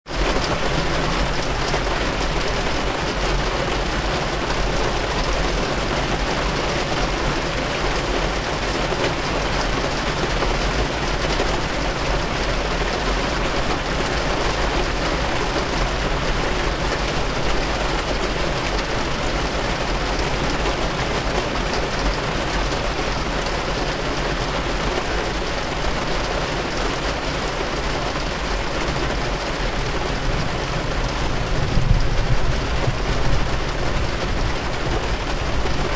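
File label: Rain Car Thunder, Rain beating on car, windshield wipers, thunder; Car in Rainstorm; Weather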